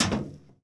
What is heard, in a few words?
catwalk step; Golden Crayon Sounds